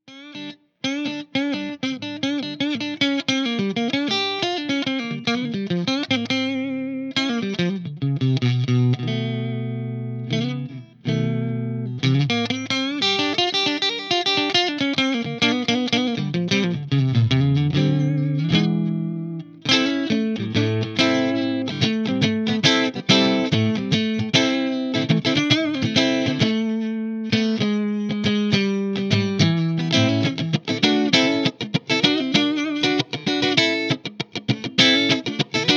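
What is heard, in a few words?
TC15 Channel 2 Lo Crunch Hi PRS Mira Single Coil Neck Mesa V30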